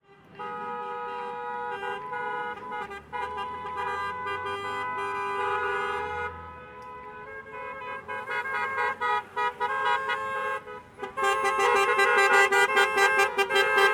Traffic, Auto Horn Jam, Various Cars Energically Whistle, Accelerations and Skids; Surround; Sonik Sound Library; Spatial Cities